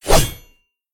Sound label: Swing; smite; god susano